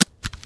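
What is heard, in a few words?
grass; Mafie 1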